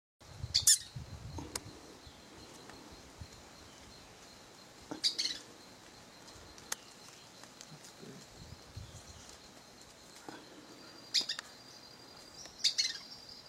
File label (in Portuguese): canto do papa moscas estrela